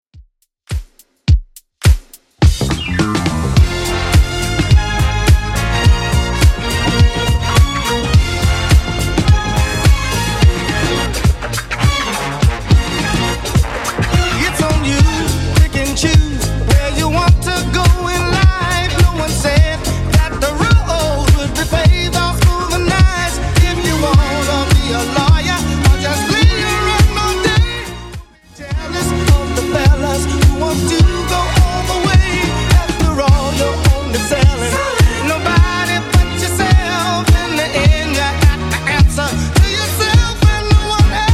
70s Redrum 105bpm

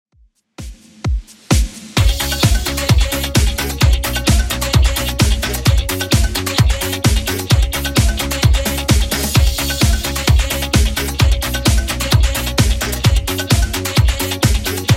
80s Redrum 130bpm

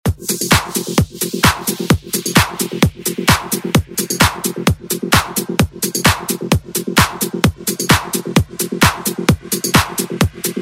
House Redrum

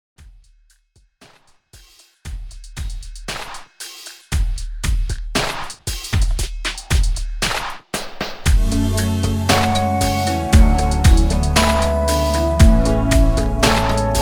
COUNTRY 58 BPM

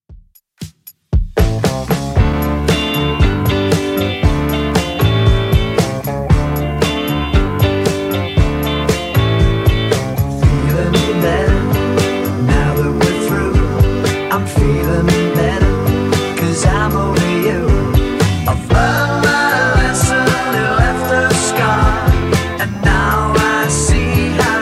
60s Redrum 116 bpm

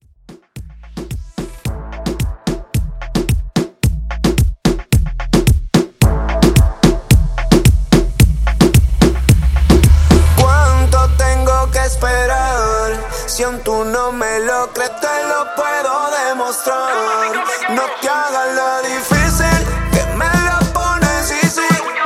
Latin Redrum Intro; Remixfreakz

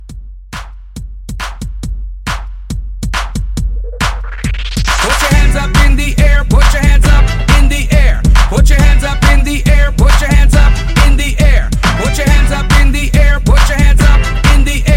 Bmore Hype Re Drum 138

